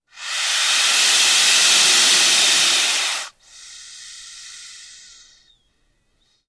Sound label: Boa, Albino Hiss. Close Perspective.; snakes